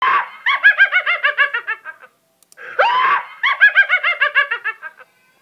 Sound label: witch laugh